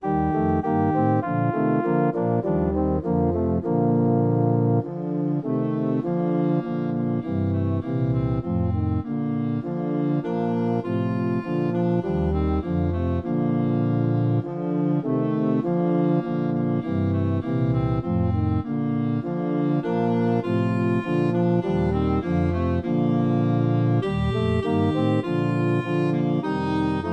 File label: Clarinet Track with Melody